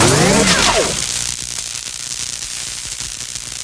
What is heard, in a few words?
medigun charged, weapons; tf